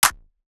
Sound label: Clap; The Lunch77 Sound Design Kit (Vol. 2)